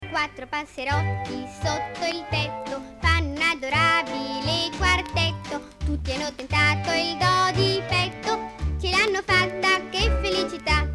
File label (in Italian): Quartetto